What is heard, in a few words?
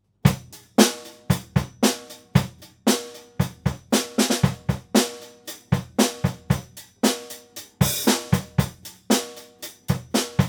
drum sonor